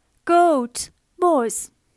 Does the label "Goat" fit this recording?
Yes